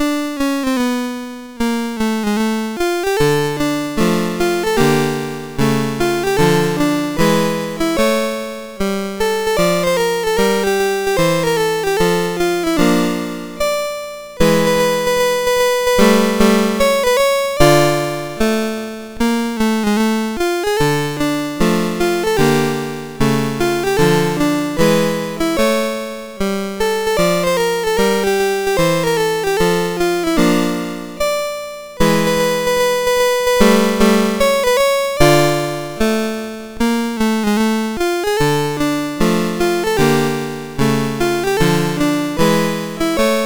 MOS6581; MOS6581R2

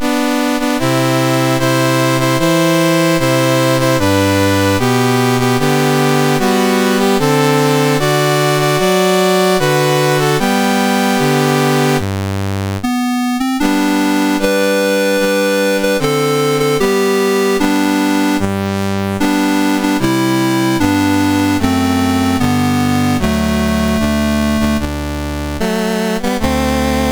Indonesia, National Anthems, MOS 6581

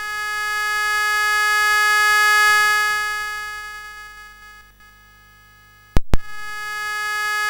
BASIC, MOS6581R2; C Prog Ref Guide example; Commodore

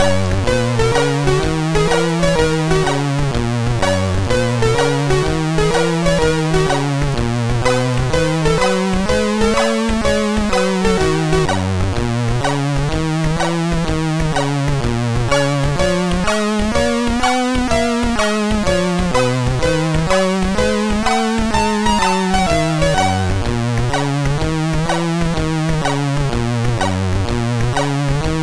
Blues sid MOS6581R3

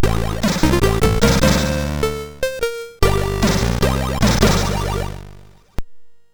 sid MOS6581R4

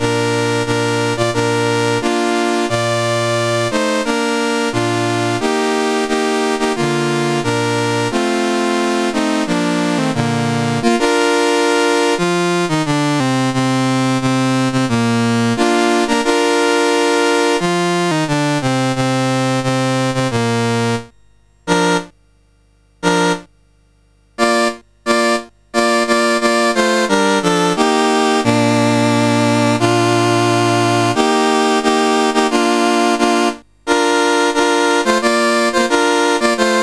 Ethiopia, National Anthems, CSG 8580; CSG8580R5

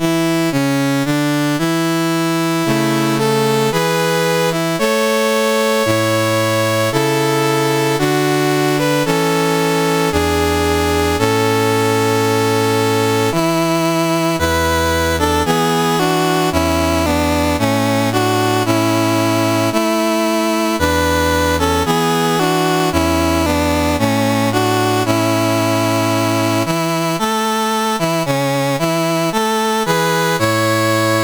Finland, National Anthems, CSG 8580

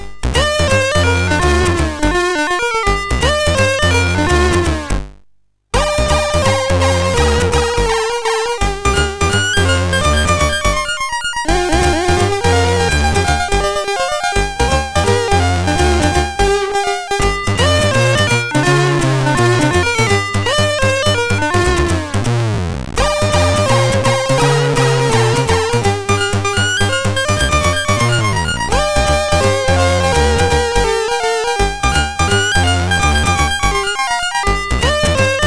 Tuning, MOS6581R3; Havoc

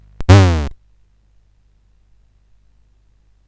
sid CSG8580R5; California Games

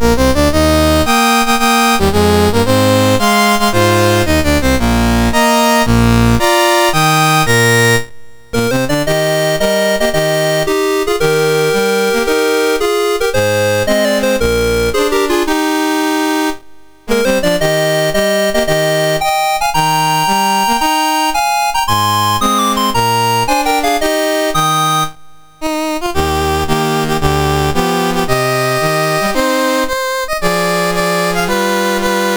Dominican Republic, National Anthems, MOS6581R2

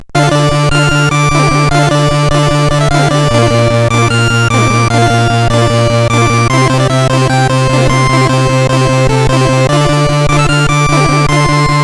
sid, CSG8580R5

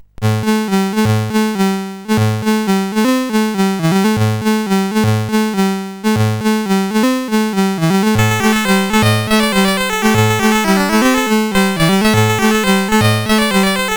sid CSG8580R5

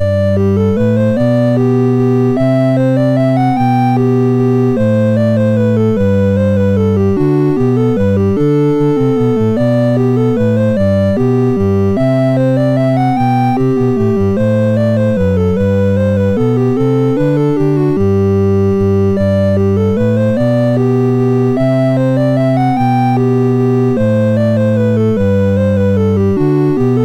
Minuet in G, MOS6581R2

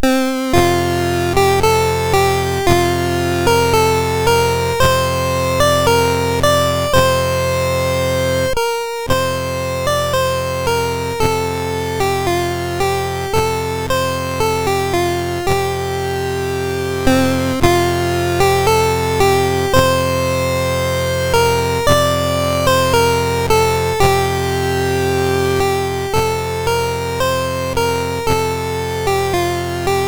MOS6581R4, National Anthems; Laos

